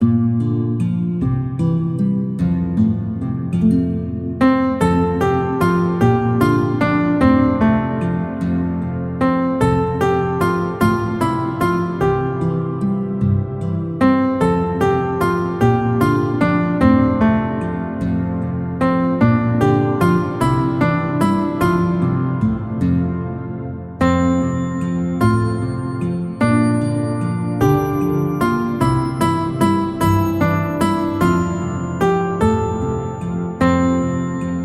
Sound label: my bonnie lies over the ocean instrumental karaoke